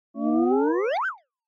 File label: Motion Pop